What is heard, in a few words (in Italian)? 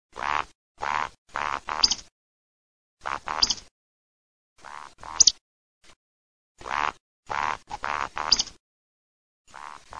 BECCACCIA